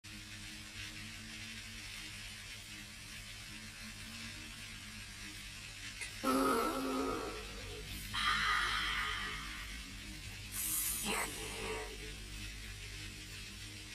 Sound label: slowmo